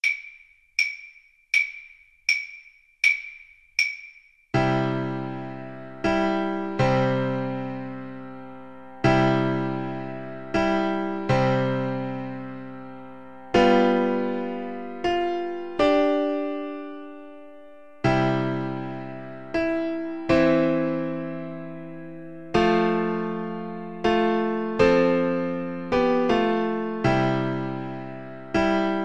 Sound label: silent night flute